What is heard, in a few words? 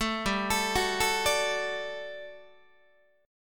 G Major 7th Suspended 2nd, down, Guitar; GM7sus2 arpeggiated